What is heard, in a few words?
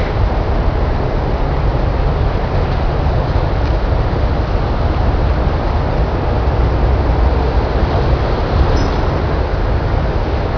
street